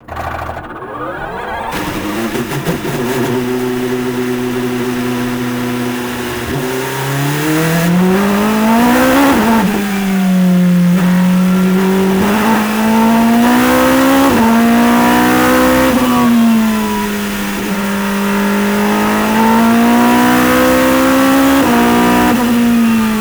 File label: Engine